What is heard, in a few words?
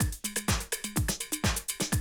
125, Classic Drum Loops